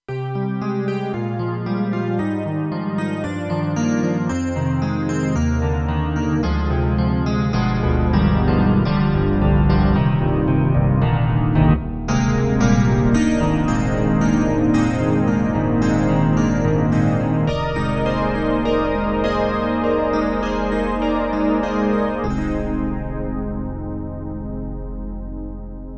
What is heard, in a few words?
KORG TR-Rack Tines; Phantom Tines